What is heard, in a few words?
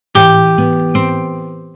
Guitar, ringtones; Sony Ericsson; W902